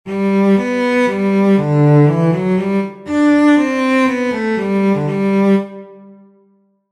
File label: cello